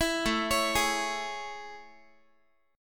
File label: A Major 7th A, Guitar; AM7; arpeggiated; 19,17,16,18,x,x